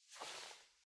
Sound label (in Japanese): シーツの上にあるスマホを手に取る音